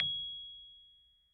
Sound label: A#7, Rhodes Mark II; mkII 88 key LO; CEPCo Sample Series Vol. 3